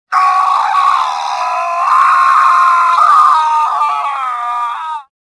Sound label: falling; Star Wars Jedi Knight II Jedi Outcast; XBOX; shadow